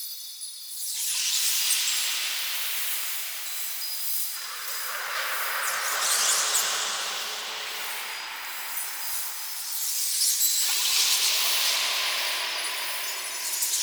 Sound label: Freeze Mote Pickup Trail Loop; Powers; Motes